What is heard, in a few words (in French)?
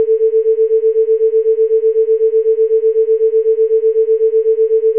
battement 8 bps